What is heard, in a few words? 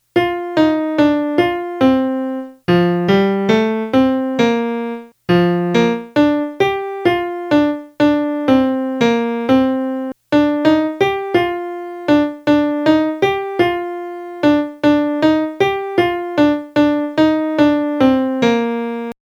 melody